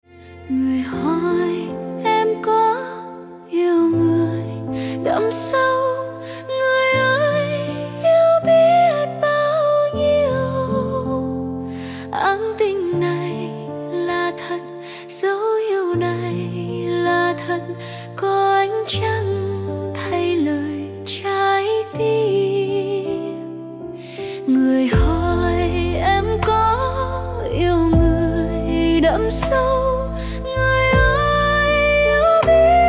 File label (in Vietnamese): tiếng việt giọng nữ AI cover